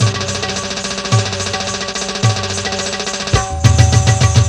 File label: roll, beats, rolls fills; Sonic Foundry (Sony Creative Software); World Pop